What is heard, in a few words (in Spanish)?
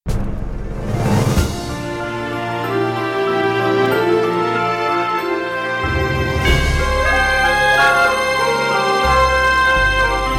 Himno; Jubileo; 800 cantos